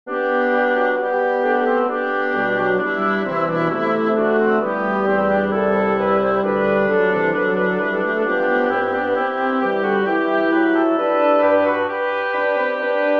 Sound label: for wind quartet; Johann Sebastian Bach; prelude wir danken dir herr jesu christ